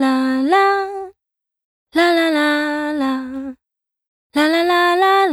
La La Single, женский вокал

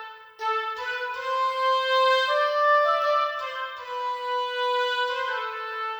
Oboe

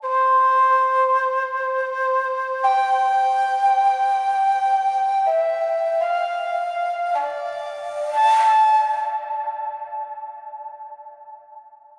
Bansuri, ambient

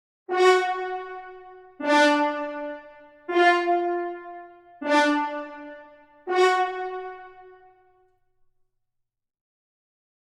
French Horn

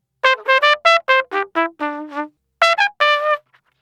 Trump Solo, brass

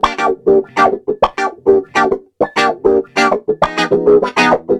Rythm Guit; лупы

